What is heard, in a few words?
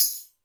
Drk Tmbrn, Alesis D4 drum machine